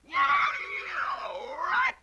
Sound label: LoFi Synthetic Squawk